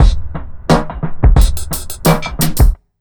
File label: 88 beat, Underground Hip Hop; USB Soundscan vol.02; AKAI